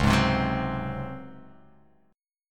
Db Major 7th Suspended 4th, Guitar Open F; strummed

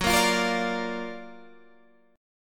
Gb Augmented Major 7th Gb down Guitar Open G

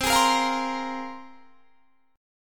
C 7th Suspended 4th Sharp 5th; C7sus4#5 strummed

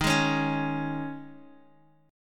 D sharp 7th Suspended 4th, Guitar, DADGAD

